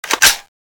boltpull; weapons

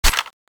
clip out, weapons; thanatos7

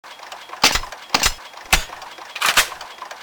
smg reload; weapons

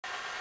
shoot end, weapons; blockar2

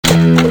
clip out, weapons

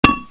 freeze bounce, weapons

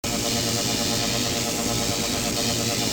stun rifle idle; weapons

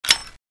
gatling clip out

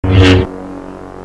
sword mid slash; weapons

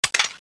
reload clip out, weapons; thanatos1

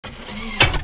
clip in, weapons; sfmg

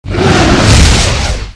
boss swing; cso

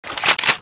spas12 draw, weapons

